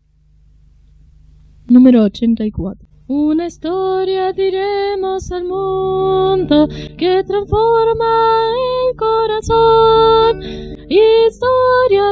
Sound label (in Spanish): Coro, himnario niños